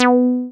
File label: SYN 303, Roland TB-303; Sound & Vision - Gigapack I CD 2